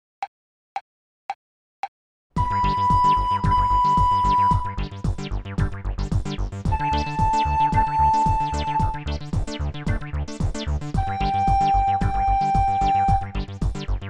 Blokfluithouse

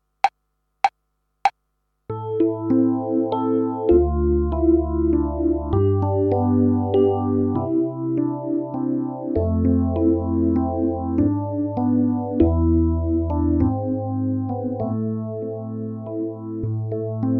Menuet

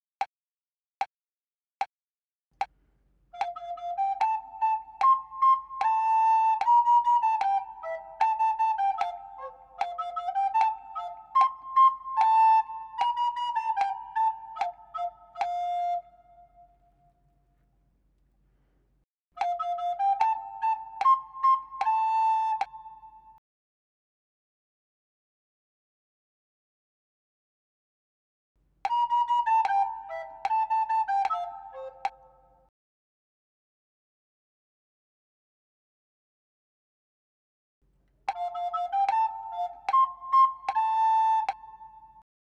Melodiedictee

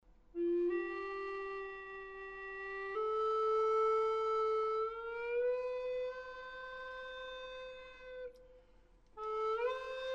vrije improvisatie

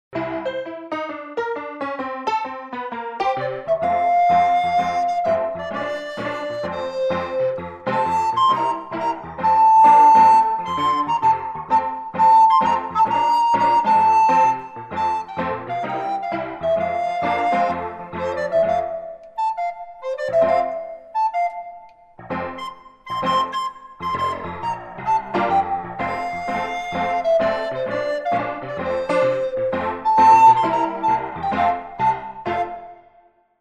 Old mac donald improvisatie voorbeeld

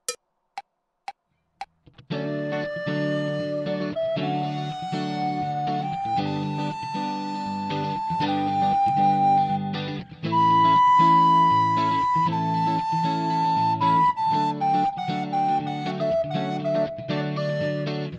Improvisatie Voorbeeld

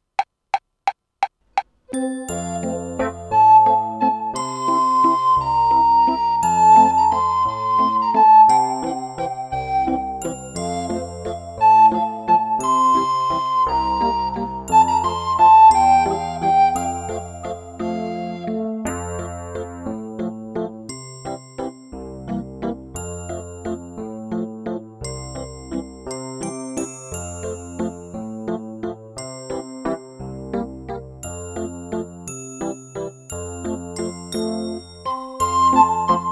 Trompetterslied

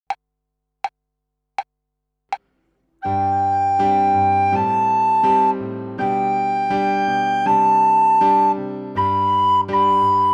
Oefenen met legato

